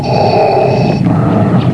jump; DarthVader

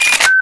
sniper; zaero; weapons

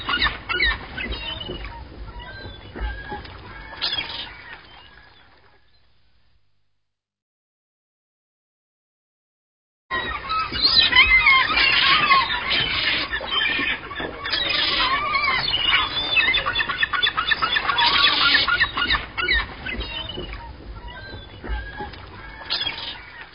seagulls

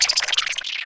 spore neutral

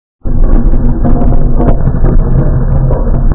door; greydeath

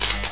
welder; xatrix

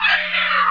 fall; ornithomimus

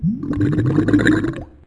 gurp; openffa; bruticus

